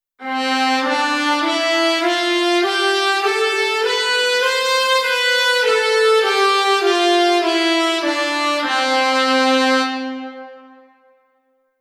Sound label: mute va